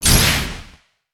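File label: katana wave, attack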